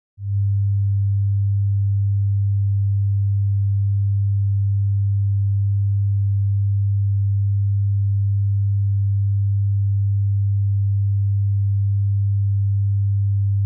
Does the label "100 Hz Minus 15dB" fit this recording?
Yes